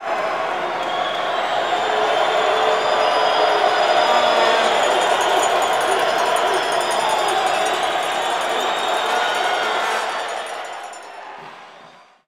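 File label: basketball crowd jeer; Crowds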